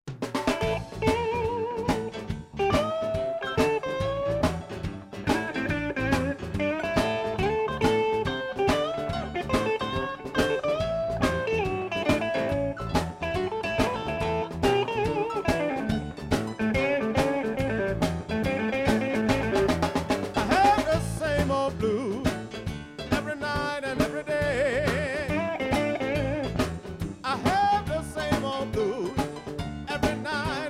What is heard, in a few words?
Same Old Blues